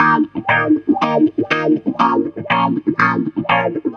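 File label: guitar, Roots Disco; USB Soundscan vol.32; AKAI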